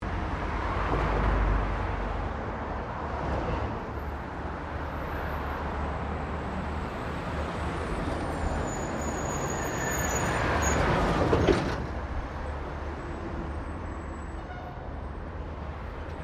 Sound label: Traffic Cars General Pass By; General traffic sounds; Traffic and Cars (Sound Effects:Backgrounds)